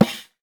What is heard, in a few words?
DEEP GOAT, GOATSKN TAMB; Voice Crystal - Steve Reid Definitive Percussion